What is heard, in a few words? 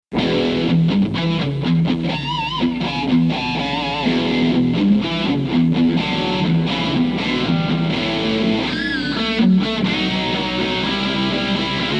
classic stack; Micro Cube